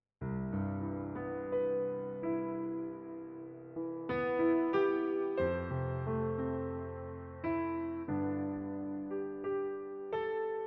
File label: Multi Volume per Slider Pitch Bend; Artis SE